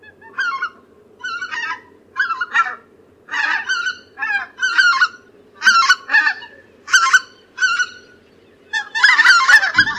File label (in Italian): Oca lombardella